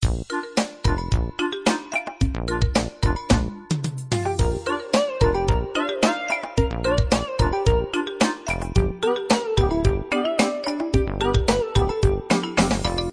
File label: bgm; eatball